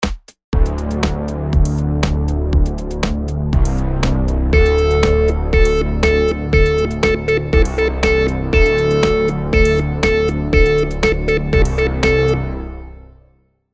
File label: rhythm simple, monotone